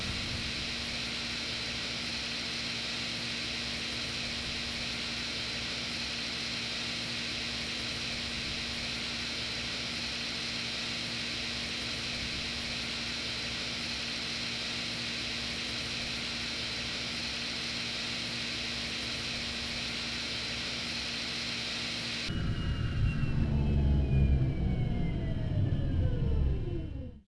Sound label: apu off